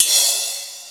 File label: RY-10, DRUMS + PERC, YAMAHA